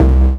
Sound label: Synths C; Vengeance Samples